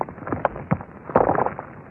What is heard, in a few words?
fissure destroy; weapons; dota; earthshaker